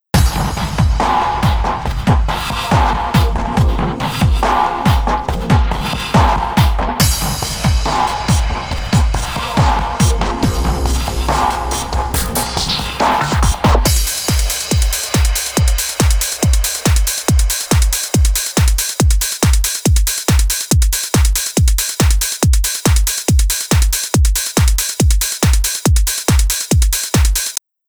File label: drum